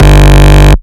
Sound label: Bass Shot G